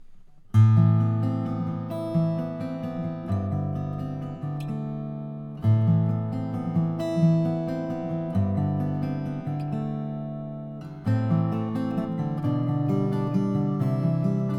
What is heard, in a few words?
taylor gce 12 fret walnut